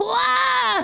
pain; goten

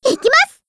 charge; nepgear

